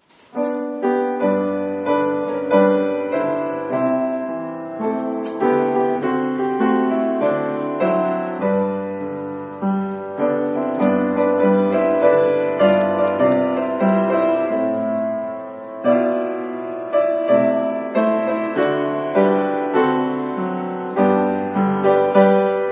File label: piano; hymn training